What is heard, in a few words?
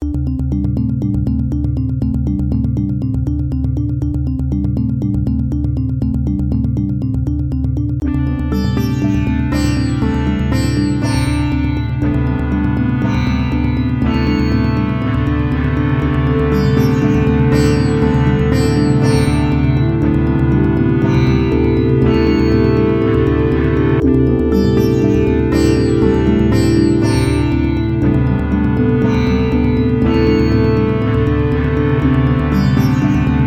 drone